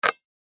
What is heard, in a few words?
net swish ringtone